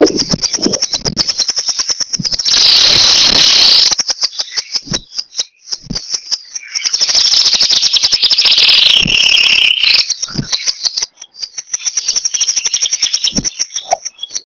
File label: Cinclodes fuscus - Remolinera